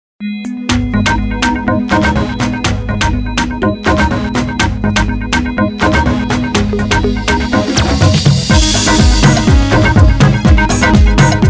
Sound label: beat